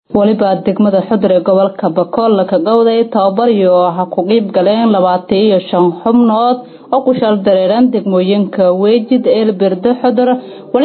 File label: sax